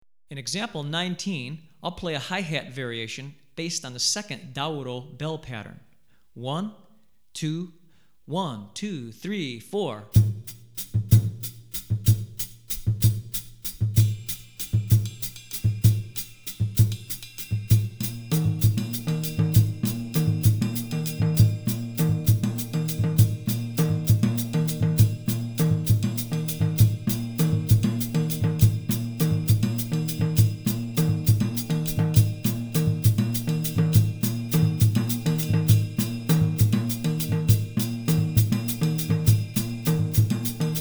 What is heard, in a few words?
Adowa, Drumset Example, West African Rhythms For Drumset; Hartigan